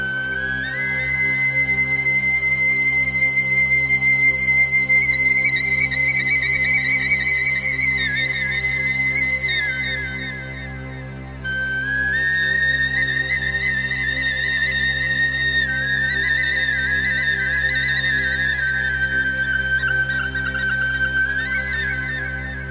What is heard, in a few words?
Bansuri Flute; ringtones